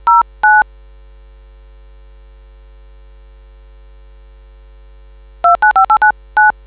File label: dtmf